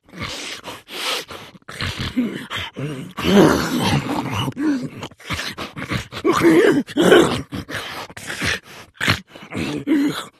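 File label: zombie sound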